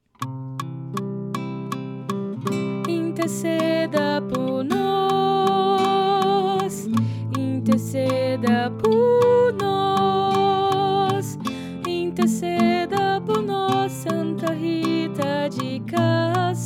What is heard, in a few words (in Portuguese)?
Soprano